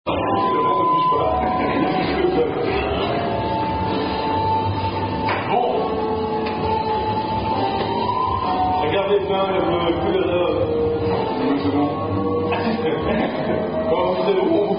Belguim Version Of Are You A Cardinal Drinking Game